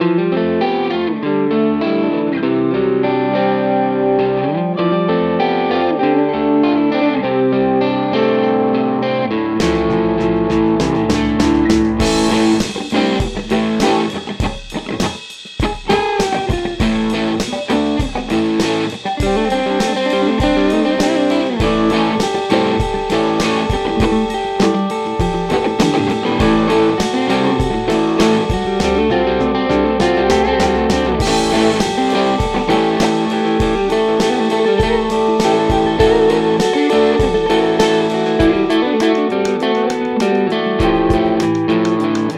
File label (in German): Walrus Audio ACS1 London Viel Gain Room